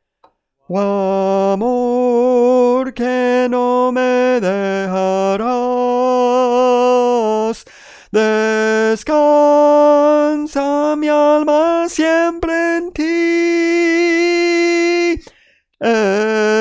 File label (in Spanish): Tenor, Cantos Espirituales